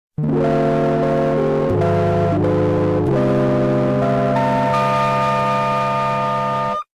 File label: game over slowed distorted; super mario world; horror